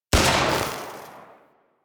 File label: Shot; Warframe; Infested